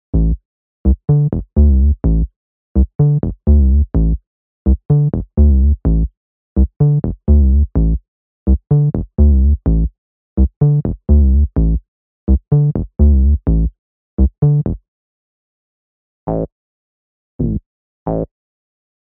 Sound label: Disco Acid